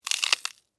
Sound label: Insect Eat Chew, Bite, Crunch, Bug; Animal; Insect Eating; BlastWave